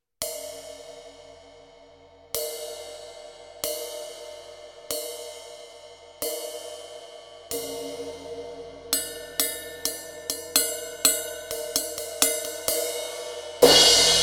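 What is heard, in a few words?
crash MEINL CUST SHOP 17, CYMBALES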